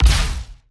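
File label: Laser gun Ballista Fire Short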